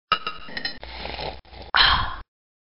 tieng nguoi phu nu uong nuoc hit ha

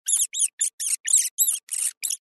tieng chuot keu hoac tieng chuot keu

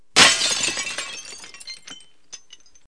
Tieng dap vo kinh cua so

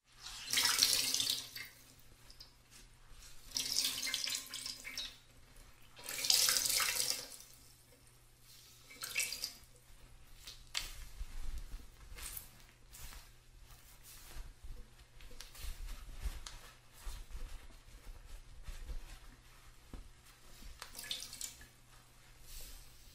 tiếng nước vắt quần áo vắt vải vắt giẻ ướt

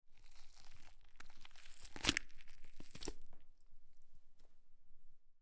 am thanh cua mot con nai can mot cai gi do

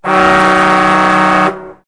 am thanh tieng coi tau du lich bien

